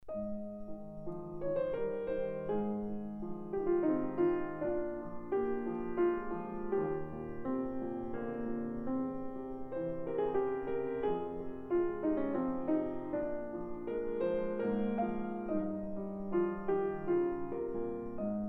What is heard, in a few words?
Canon in Ab, piano; Ciurlionis